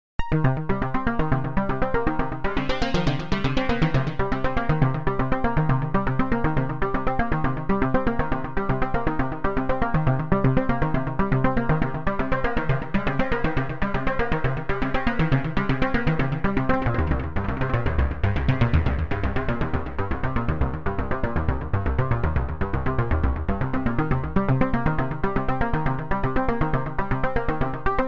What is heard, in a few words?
ARP 2600 V